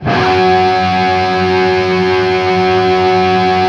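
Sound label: power F# loop, guitar power chord loop; East West; Steve Stevens Guitar Samples Collection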